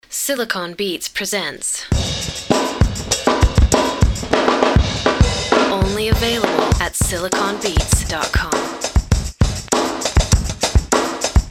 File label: killer breakbeats